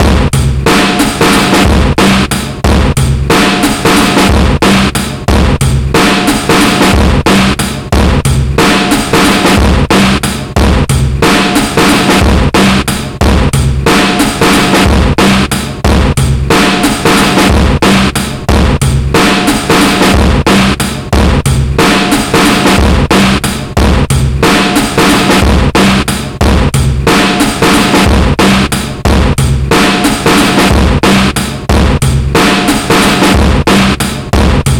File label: DIRTY DRUMS, 91 bpm, Breaks; POTBreaks